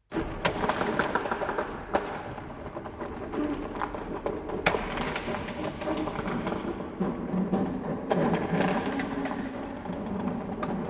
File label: Industrial Mechanical Electric Door Large Mid Perspective Open; Metal Door; Metal Door Sound Effects